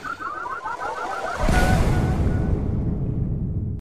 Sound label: Death Scream